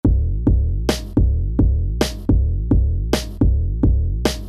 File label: Dancehall